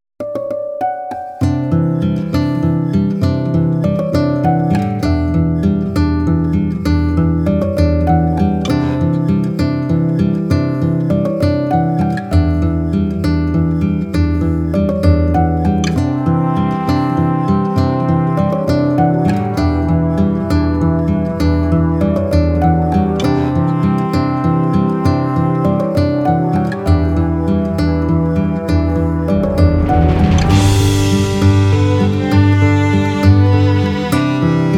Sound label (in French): Guitare classique